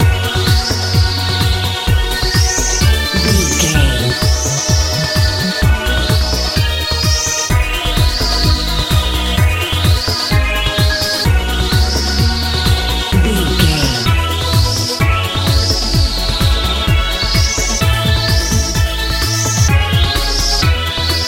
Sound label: blooming warm big wind sprint life techno